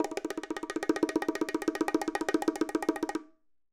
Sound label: bongos Roll; raw perc one shots; SEISMIC Drum Sample Pack; Slate